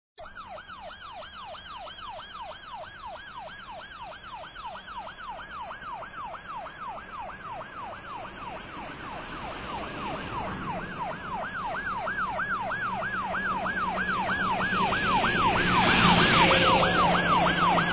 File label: Police Car Approach and Stop Siren; trasporti; soccorso